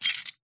metal pick up fantasy material action movement texture; ancient game; epic stock media